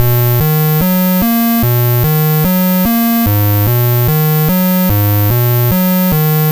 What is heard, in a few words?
Apple II Bass, Chiptune; 8-Bit; Sonic Foundry; Sony Creative Software